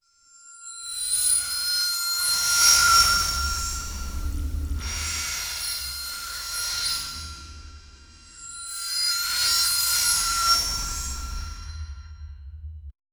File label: cut the iron like anime, metalworking